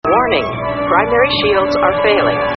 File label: warning